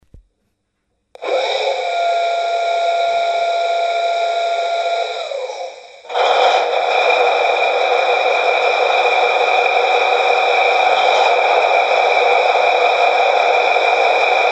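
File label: Large Multi Cylinder Air Start Diesel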